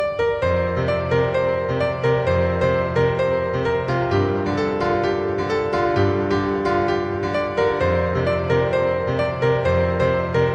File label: piano